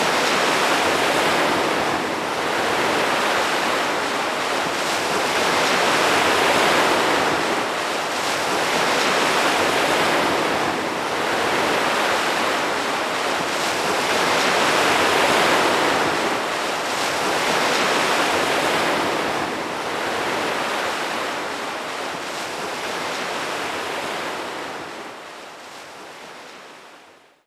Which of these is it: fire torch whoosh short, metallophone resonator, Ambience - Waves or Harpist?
Ambience - Waves